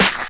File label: Snare, Hip-Hop